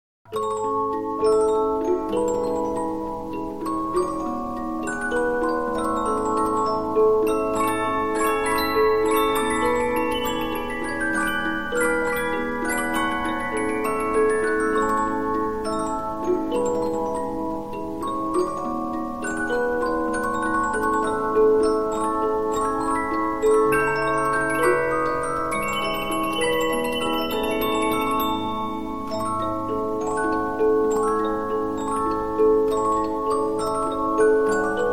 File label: Polyphon, 15inch disc musicbox